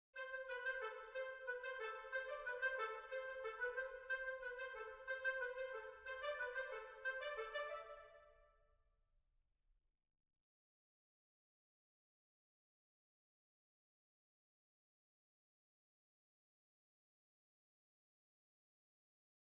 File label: Woodwinds